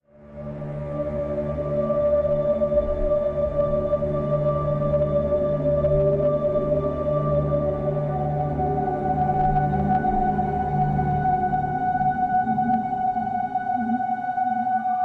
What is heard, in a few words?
Submerged Drone Ambient